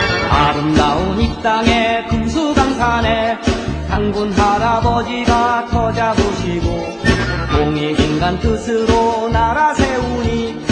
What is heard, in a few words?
korean song